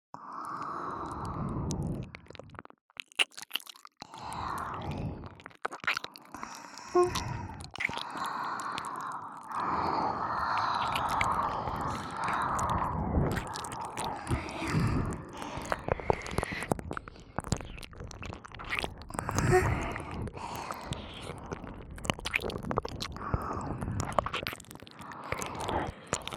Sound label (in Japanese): 両耳舐めループ; SE有